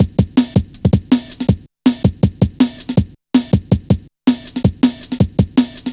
drum loop, 161.5; Zero-G Jungle Warfare 1, 2 & 3

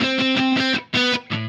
Happy Pub Guitar

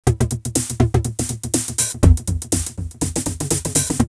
909 Delay Break 122, drumloops, DrumMachines; X-Static Goldmine

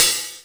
OPEN HH; Big Fish Audio; Synth City

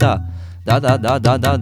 India, Heart of Asia

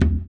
surdo; ILIO; Ethno Techno

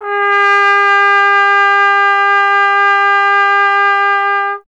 Trombone G; Miroslav Vitous - Solo Instruments VOL-1

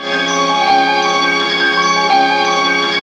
Mood Loop; Zero-G Jungle Warfare 1, 2 & 3; Strings & Things

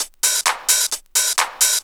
drum 130 hihat, Techno Trance, Drumloops

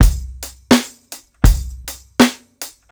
DRUMS LOOPS, 82; BASEMENT DRUMS VOL 2

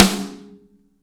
SN AMBIENT, Real Mega Drums

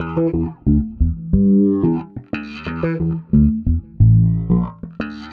Filtered Funky Bass, Electric Bass; Sonic Foundry (Sony Creative Software); Leo Cavallo Bass Taster

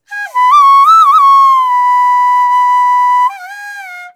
VENU, Old World Instruments, World Winds; E-MU Producer Series Vol. 7 – Old World Instruments; World Combos

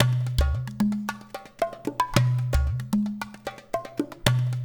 LOOP 4 R, Percussion; InVision Interactive; GRUNT

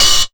127 DRM, Fresh Disco House

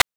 PITCHED NOISE; NES DPCM; MIDINES

